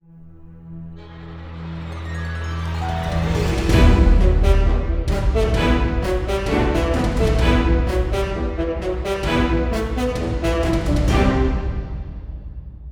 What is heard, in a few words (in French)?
exemple hard; eastwest qlso